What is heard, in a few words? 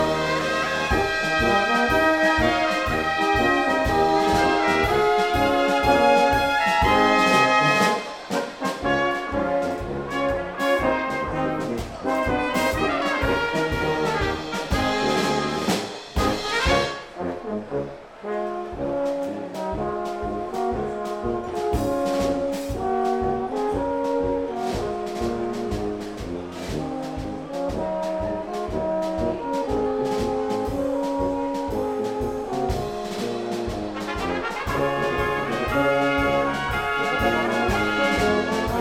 polka